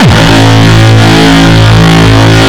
Longkick, kicks

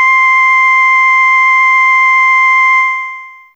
Alto C5, Humans; Fairlight CMI IIe

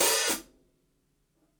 ROOMY OPEN HH, HI HATS; Basement drums vol 2

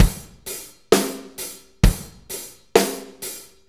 4-4 Loop, Drum Loops, Full Kit Loops

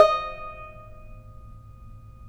TAR; Roland - LA Composer vol. 3

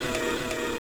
MOV; Total Control - Action Cutz; COMBAT

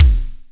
BDRUM, Simmons SDS5

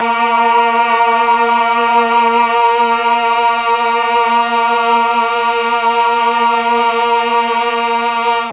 A#2 8VOICE CH, Mellotron, CHOIR; Mike Pinder - Mellotron Archive CD

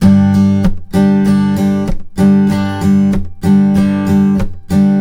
RIFF, Blues Guitar Samples; ILIO; Fretworks

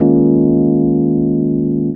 RHODES; Houseworx

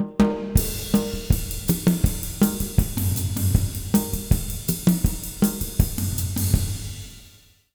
160, Jazz Latin Drumloops

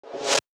Snares, Elektron MachineDrum SPS1 MKII

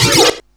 stabs; stabs & hits

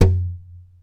TAP, FLAMENCO Guitars

